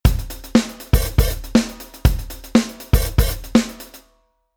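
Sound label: Rhythm 16beat Hi-Hat Open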